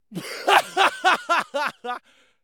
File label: Male Laughing Out Loud